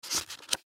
Manual Close Book Pamphlet; Paper; Office; Materials; Blastwave

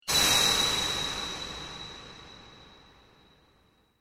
Scream Flashback Vocal Flash Scary Haunted; Flashback Vocal Scream Flash Scary Haunted Piercing; Horror Transition; Evil Voices

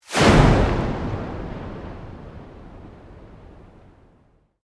Sweep Organic Medium

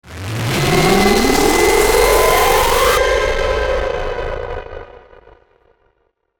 Ascend Descend Distortion Hum Buzz Power Up; Ascending Sound Effects

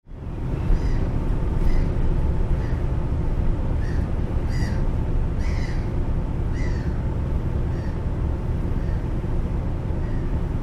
Ship Large Ferry Corridor Atmosphere; Tom Hutchings

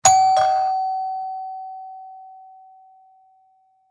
Household Door Bell Ring Close

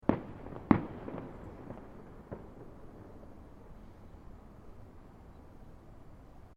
Fireworks Multiple Firecrackers; Fireworks (Sound Effects:Backgrounds)

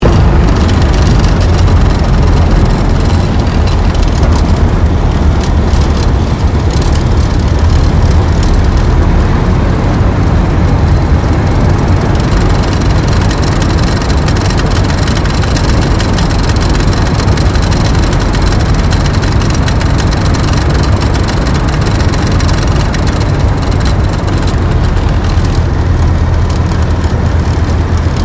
AIRCRAFT T-28D TROJAN LAND INTERIOR

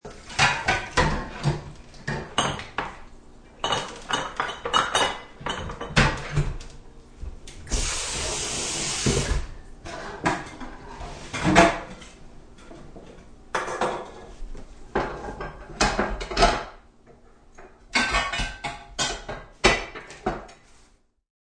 Wash Dishes, The sounds of washing dishes.; Cleaning; Household

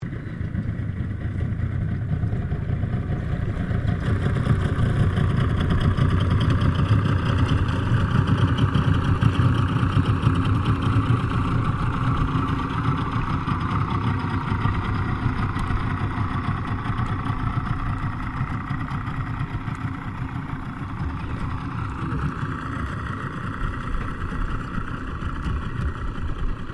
Boat Motor Outboard Johnson55 Passing Slow; Various private boats; Boats (Sound Effects:Vehicles)

